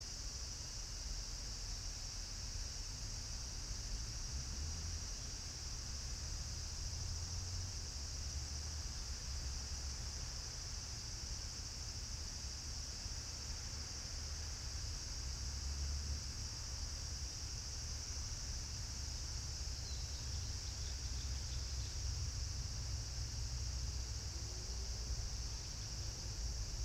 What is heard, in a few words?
yamanakako sound